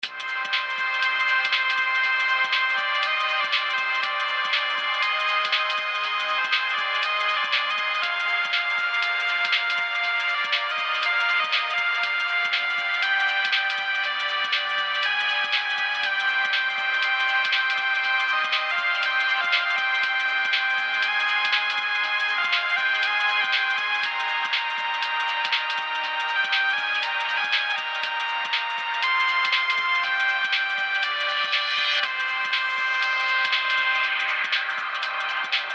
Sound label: techno jam filtered; Poptropica